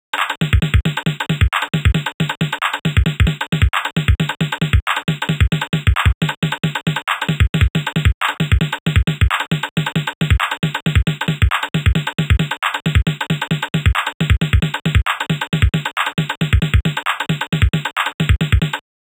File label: bar code beats schnell